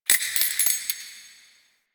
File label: Sparks; Ambience; Warframe; The New War; Quests; Zariman